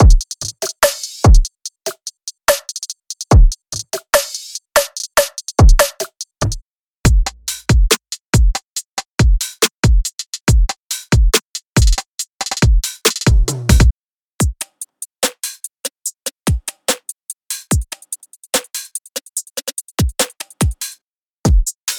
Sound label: Drum Future Bass Melodic Trap; Drum Monkey; Unison MIDI Wizard Plug-In; Drum Demo